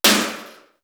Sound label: LITE MET; East West; Global Sounds; D4 FXS